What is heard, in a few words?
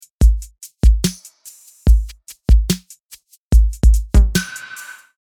Key 2 full beat